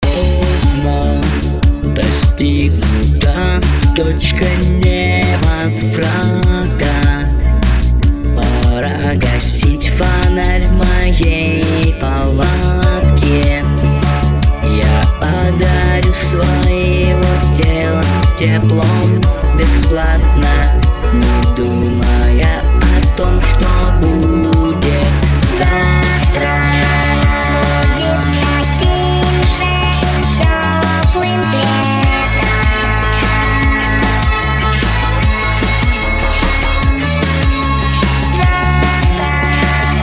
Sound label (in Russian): Лиричный пост-панк трек; Лиричный пост-панк трек для разнообранзия